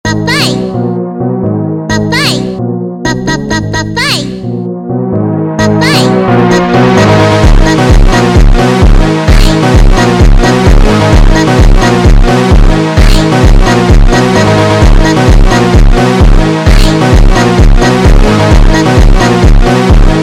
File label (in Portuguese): Automotivo